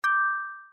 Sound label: win